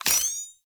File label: Pistol Laser Aim; Lasrian